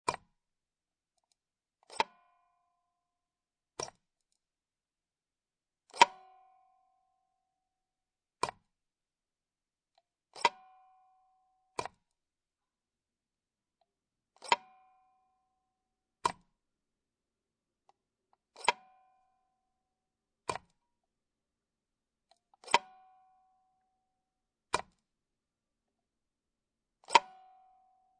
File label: Sewing Machine Foot Pedal, Household, Sewing Machine, Old, Foot Pedal Movements, Many; Miscellaneous Household